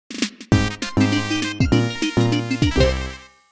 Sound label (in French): ingame jingle niveau termine; level 22